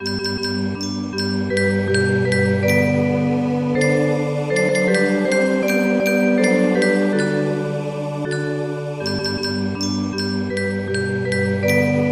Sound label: polyinstru; hymns; Himnario grande